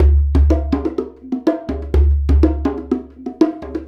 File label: Combo, Essential Percussion